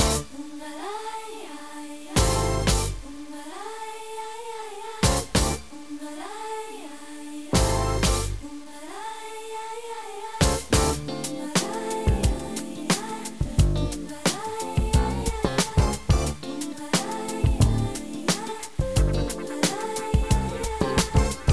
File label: upbeat